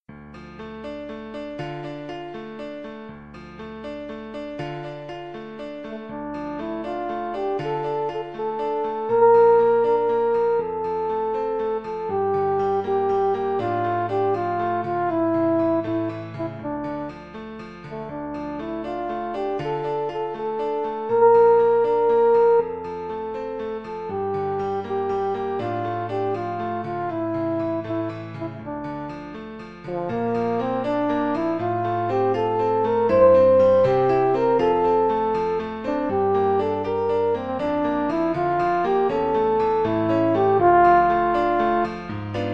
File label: Vltava, french horn